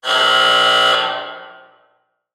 crane alarm; ambience

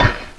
axe hit; weapons

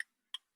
car indicators; ambience

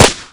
uzi fire; weapons

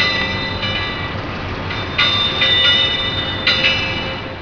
seabouy; caged

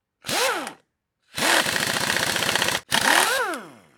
repair sound; items

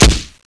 mp5 fire; weapons

